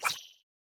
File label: Gecko Whip; Super Mombo Quest